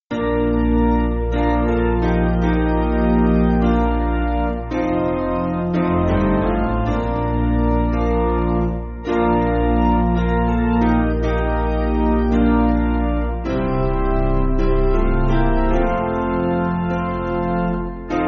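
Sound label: Piano, church backing; Basic Piano & Organ (CM)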